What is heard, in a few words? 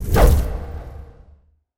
torch; ambience